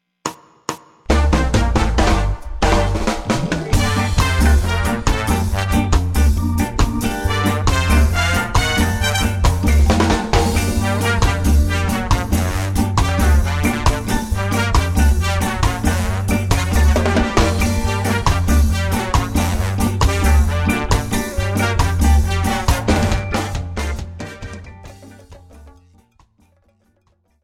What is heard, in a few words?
Reggae